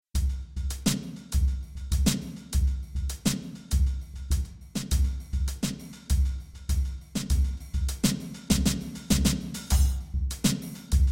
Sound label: Club Drum Set; sibelius sounds